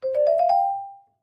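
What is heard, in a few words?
Clavichord Short Major; keyboards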